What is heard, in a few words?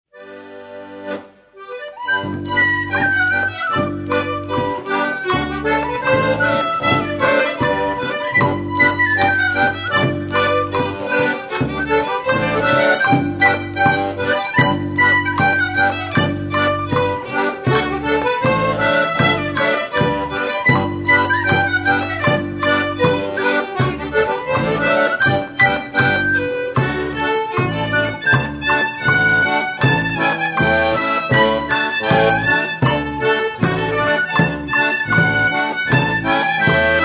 Polka